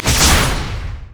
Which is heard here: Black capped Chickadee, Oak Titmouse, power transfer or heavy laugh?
power transfer